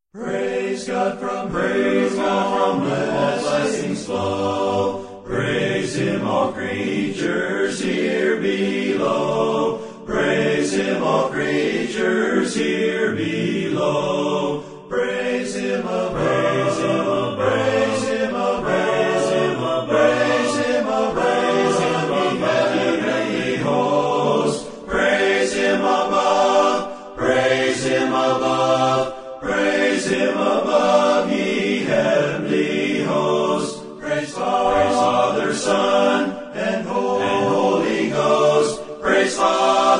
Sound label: choir